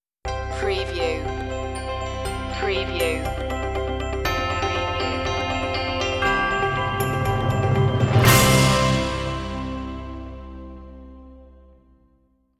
stinger

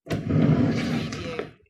Open Drawer

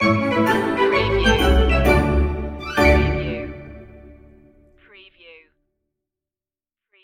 playground of happiness stinger

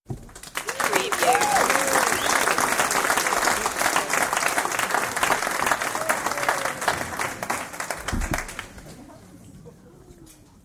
Audience Applause; beanstalk audio

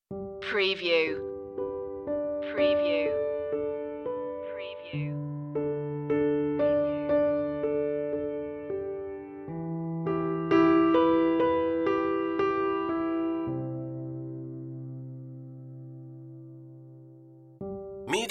piano lullabye

